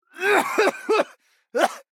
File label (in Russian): Кашель; Лусио